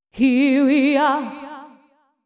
deep house female, 125, A, vocals

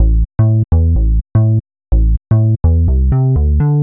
HOUSE BASS 125 A

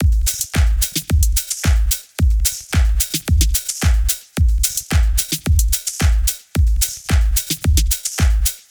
110 Vinyl Funk, Electric Drums; Loops; Touch Loops; CosmicFunk

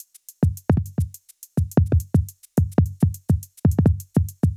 105 Loose Grooves, Percussion

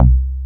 CHUNG BASS C2

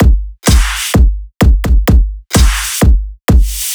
drum loop 128 Strp; Electro; drum loops; Anthemic

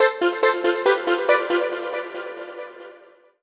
TECHNO SYNTH 140; Keys

